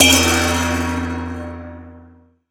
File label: gambler win; Butterfly Lovers; wazdan; ultrafast